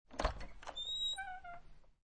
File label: Household, Door Open, Squeaky, Wooden, Attic Door; Creaky Door